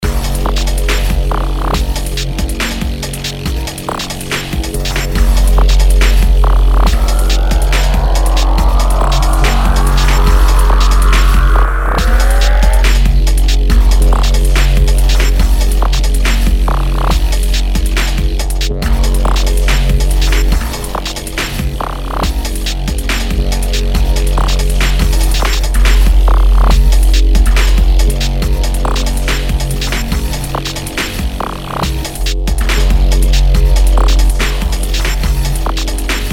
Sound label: Dubstep